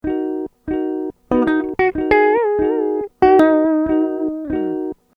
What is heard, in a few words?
Guitar Loop Reamp Test